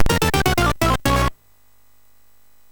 Jingles, MOS6581R3; hvsc

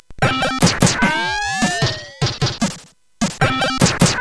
Tecnetium, CSG8580R5; hvsc

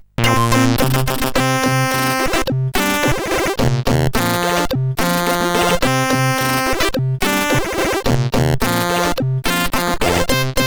Happy Tune, CSG8580R5

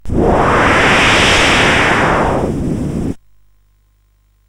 Incidental sid CSG8580R5; Street Fighter II; hvsc

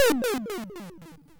Fuzzball, MOS6581R3

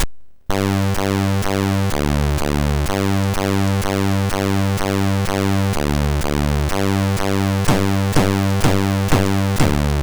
House Mix sid MOS6581R3

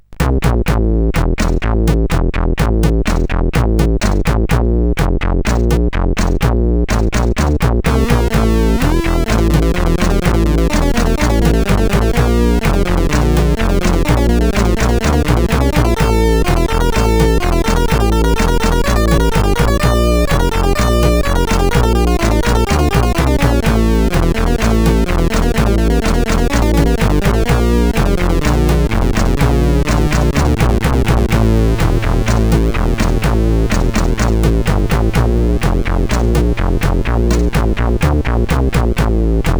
Sheep, sid CSG8580R5

